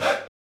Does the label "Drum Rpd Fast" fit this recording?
No